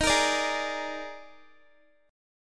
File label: Eb Major 7th Suspended 4th, Guitar; EbM7sus4 strummed